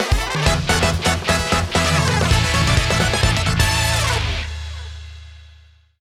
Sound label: Win Jingle